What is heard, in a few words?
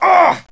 male pain medium; jcdenton